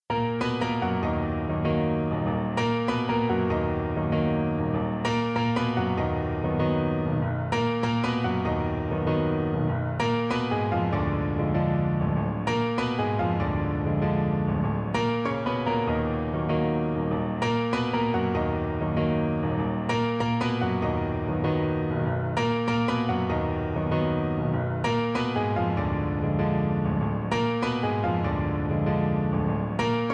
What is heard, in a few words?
Prelude E flat minor; Deux Preludes op.32; werke fuer tasteninstrumente